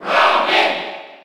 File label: Cheer French; Robin; SSB4